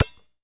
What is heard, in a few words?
Street Ball Bounce Concrete; SFX Kit; Street Basketball